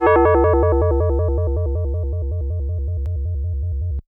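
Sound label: FM Bell FX DX100 D#5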